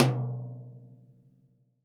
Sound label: TD-20 Tom A, Roland TD-20 Toms